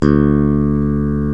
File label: FACTOR BASS; Greytsounds